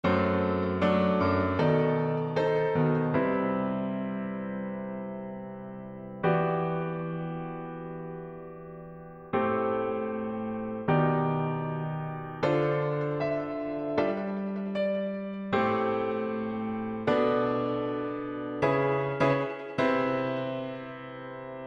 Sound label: piano bounce